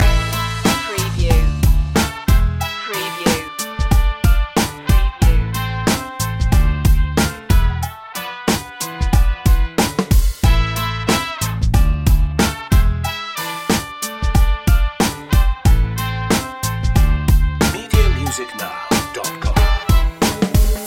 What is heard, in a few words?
hip hop from cuba loop